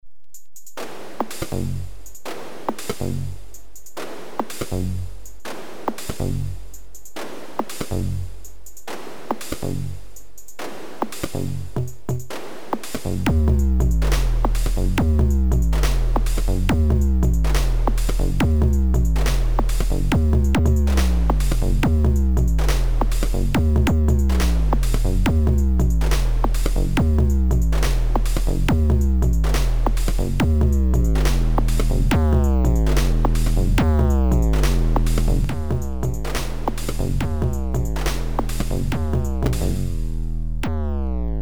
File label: drum Distorted